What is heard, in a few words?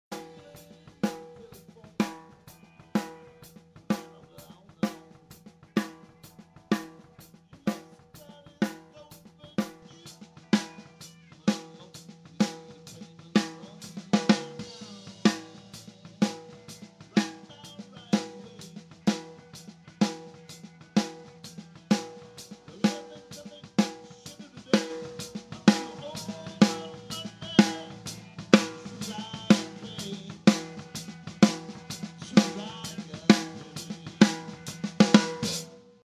Snare, Drums; Drums Submixes